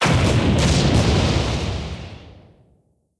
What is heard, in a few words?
Boom; blue archive